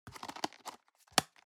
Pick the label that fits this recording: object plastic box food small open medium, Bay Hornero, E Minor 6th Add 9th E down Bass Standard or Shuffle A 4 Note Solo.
object plastic box food small open medium